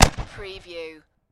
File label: Firework Mortar Launch